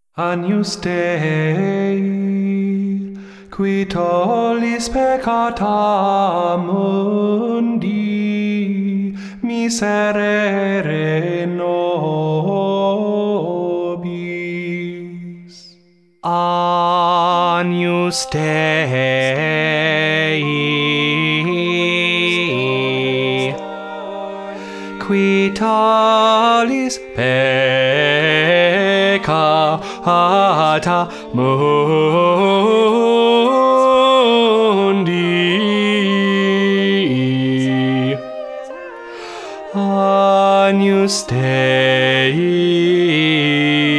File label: basso agnus